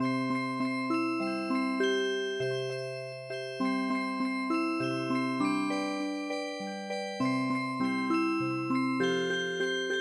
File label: Music Box, traditional; Sally Go Round